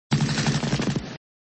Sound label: Sound Machinegun sound, flipper; Machinegun sound v1; Indy